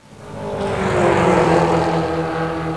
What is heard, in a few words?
indy, automoveis, corridas